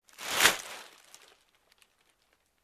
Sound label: Garbage Bag